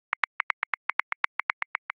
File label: Hip Hop Push Pull 16ths 80 bpm; Grooves; Ableton Live 12 Lite; Core Library